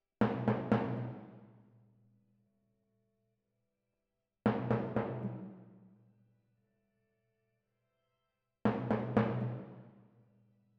Twin F Timp

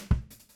BD Out

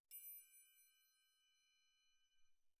TRIANGLE CLOSE

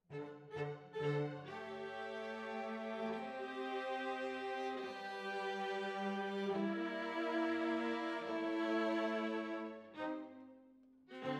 Violas, jazz for piano

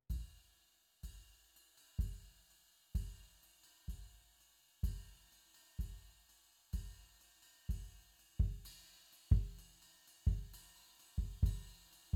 Kick IN

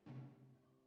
DRUM VERB

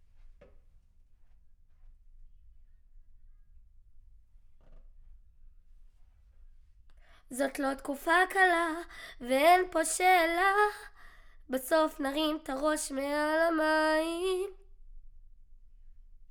Children Choir; Studio Bekol Children Choir

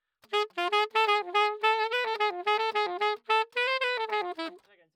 Sax

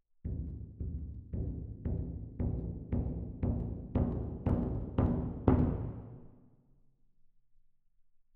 Perc

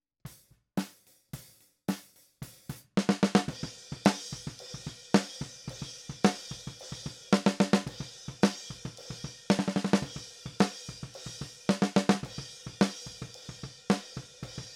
Snare

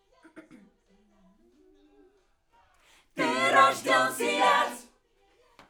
Backs AKG

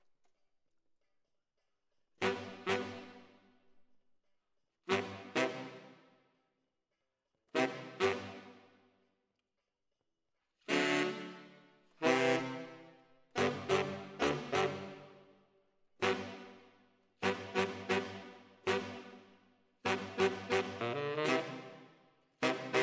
ORTF SCHOEPS, piano

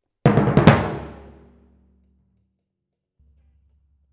Timpani

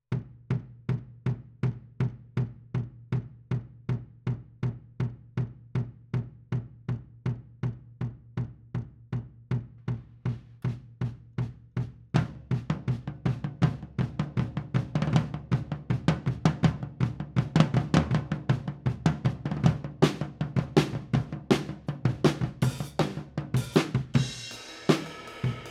Kit, 160 BPM

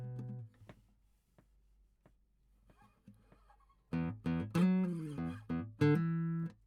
GTR

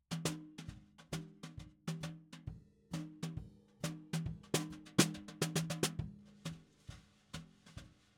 SNR BTM

